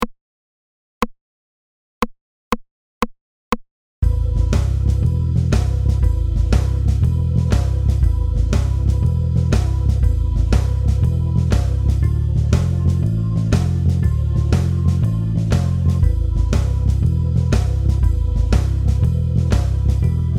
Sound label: backing, 120